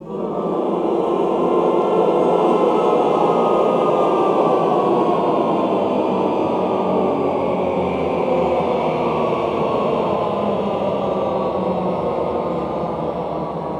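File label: Cluster Glissando Down, Classical Choir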